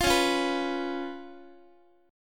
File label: C sharp 7th Guitar; strummed; chord; x,x,8,11,9,10